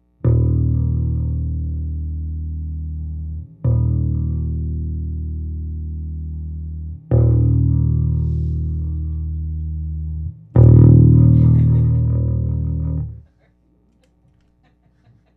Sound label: bass